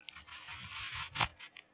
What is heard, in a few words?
shine; RubiCon